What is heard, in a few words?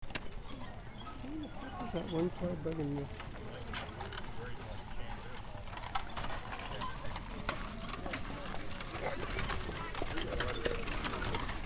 blacksmith sound